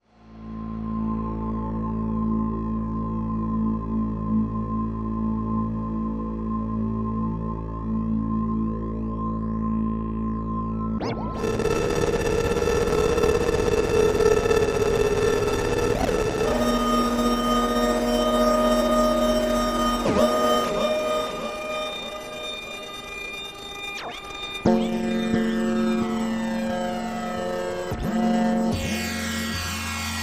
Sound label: Music Pad